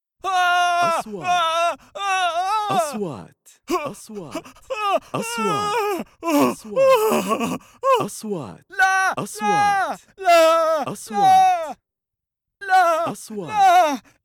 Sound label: scared man